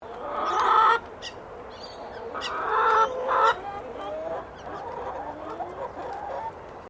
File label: Bird Chicken Berel Clucking; Birds from Collingwood Children's farm; Birds (Sound Effects:Animals)